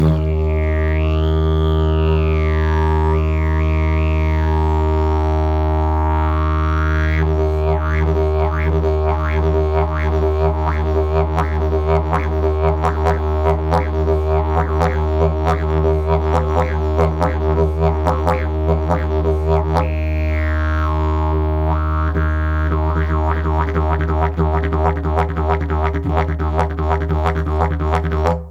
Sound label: didgeridoo; olse mrakoslap; 31 mm; Povrchová úprava : moření, lak; Ladění : Dis; Výrobce : Tomáš Dufek